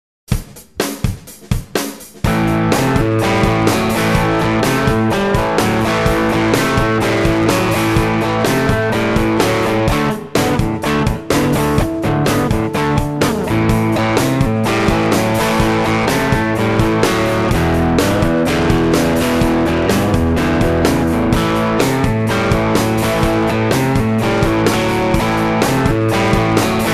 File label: Rhythm Guitar